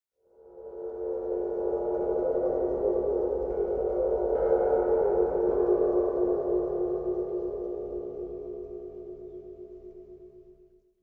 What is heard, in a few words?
Cymbal Med Soft Trill, drums cymbal